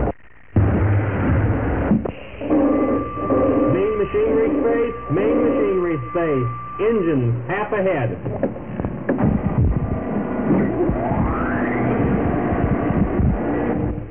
main machinery

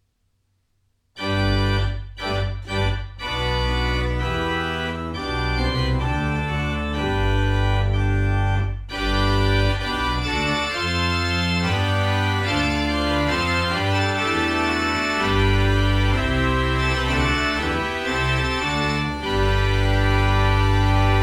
full with mixtures, organ

